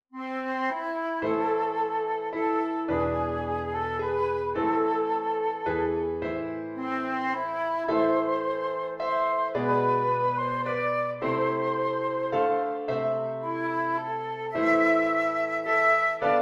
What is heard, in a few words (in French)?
Menuet flûte et piano; musique instrumentale